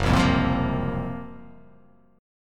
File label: Gb Major 7th Flat 5th Gb; strummed; JGuitar